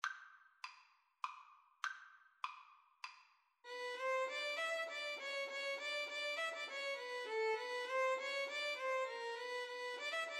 paganini minuetto, Violin-Cello Duet

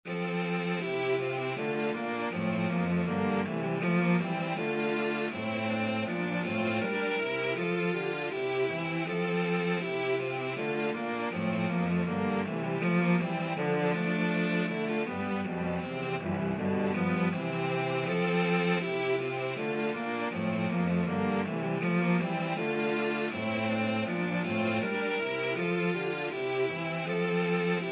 hornpipe, cello trio

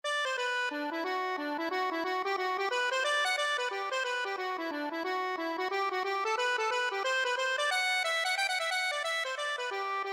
hawks, accordion

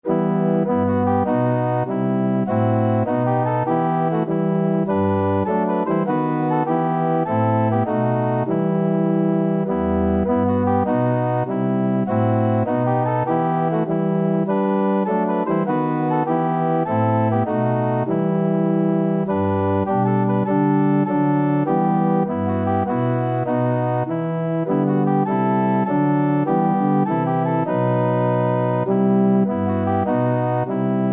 organ

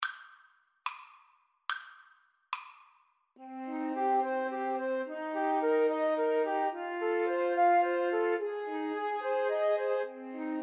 house of the rising sun flute trio

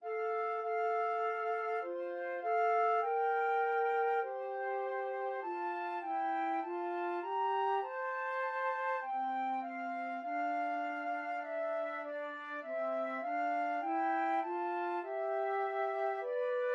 o come flute duet

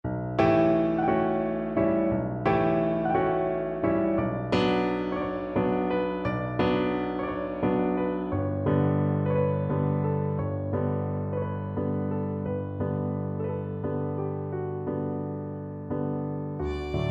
songs my mother taught, violin duet

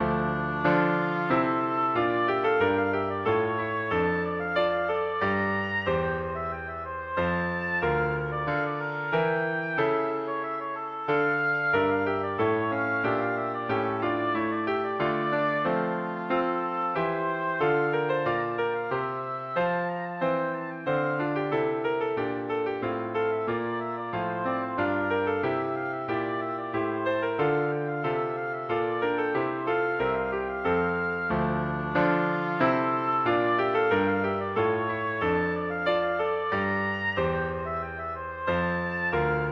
rondeau, oboe

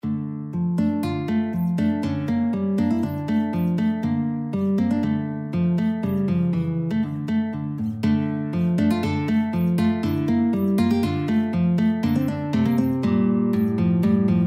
hungarian full dance, guitar

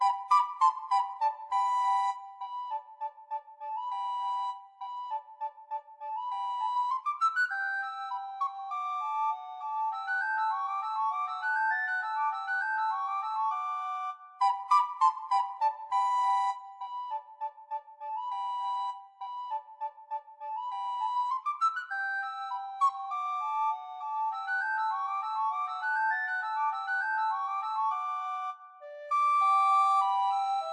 recorder

